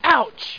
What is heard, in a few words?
ouch; billyboy